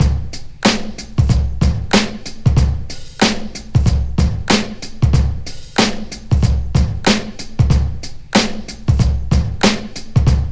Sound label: 93.5 Bouncer, breaks; Lockdown Breaks